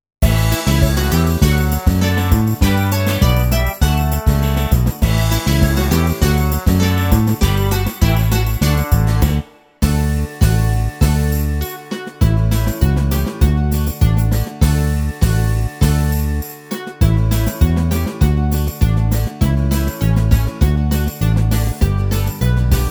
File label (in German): Kindergartenlied Karaoke